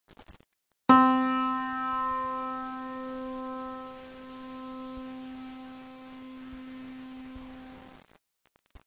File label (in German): Klavier, Ton c